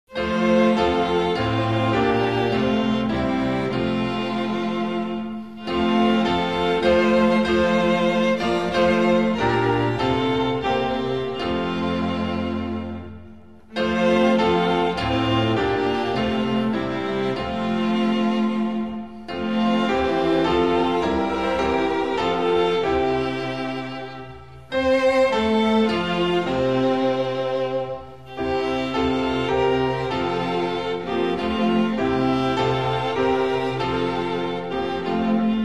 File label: instrumental; hymns spanish